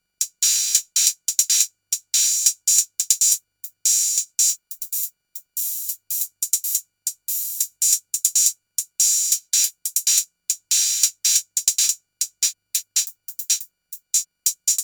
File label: Hihats